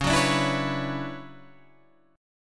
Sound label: D Major 13th Db, chord